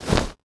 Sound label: parachute